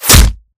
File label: bullet light ballistic flesh; Titanfall 2